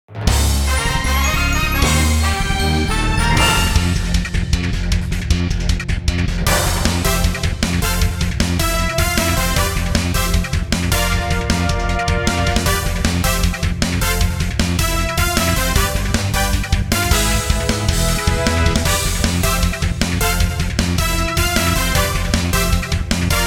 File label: Youthful Victory; legend of heroes sen no kiseki iii original soundtrack complete edition